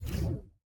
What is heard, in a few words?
Hand Paddle Release Light